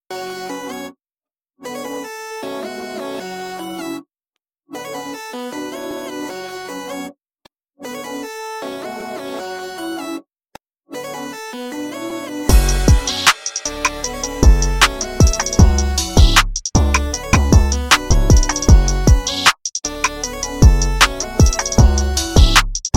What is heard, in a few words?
Instrumental Rap Hip Hop Beats